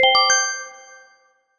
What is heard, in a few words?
Correct Answer